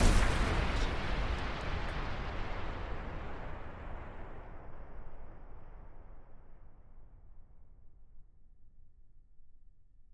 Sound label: Explosion Blast; SoundsCrate